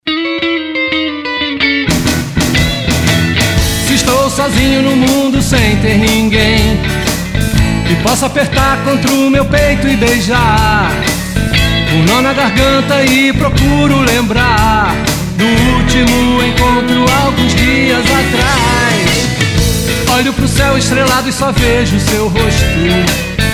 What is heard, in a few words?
acidente rhythm n blues